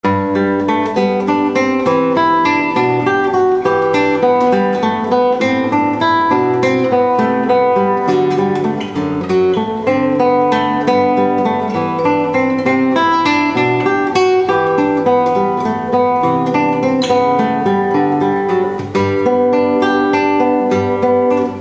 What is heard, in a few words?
Wedding Ceremony, Classical Guitar; Jesu Joy of Mans Desiring